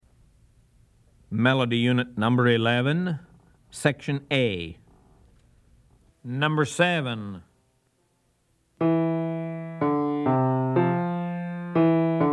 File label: melody, ear training recordings